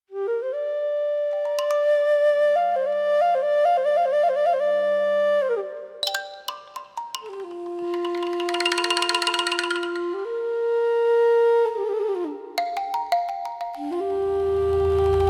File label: ching bells and bamboo, folklor yugo vostochnoj azii